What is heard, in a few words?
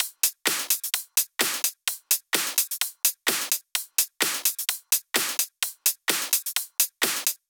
Cutter Drums 1, 128BPM; Vengeance Samples; Cutter Kit